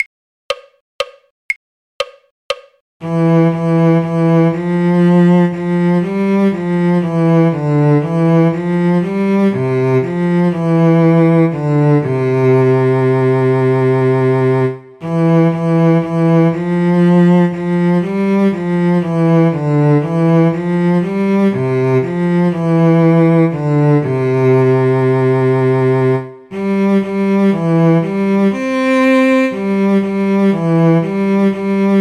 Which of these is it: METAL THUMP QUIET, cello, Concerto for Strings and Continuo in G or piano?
cello